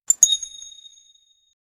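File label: tablet notification; bright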